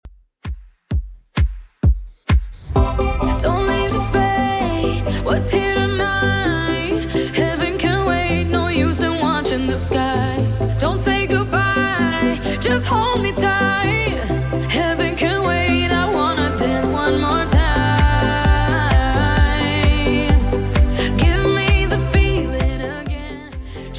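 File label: House Redrum 130bpm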